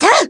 Smash Up; Zelda's upward smash attack shout

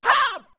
Attack

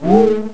Element Infuse; Elements infusing a sword

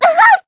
Tornado Blinded; Zelda blinded by the black tornado appearing; Skyward Sword

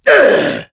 Attack; Ghirahim attacking Impa then retracting; zelda sounds; Skyward Sword

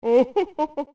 Laugh; Twin jugglers laughing

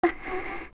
young breathe; Zelda

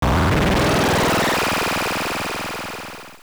Barrier, barrier dispelled; Great Temple's barrier dispelled; AOL

